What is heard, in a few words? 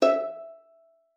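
violin pizzicato E5; Xsample VOL-5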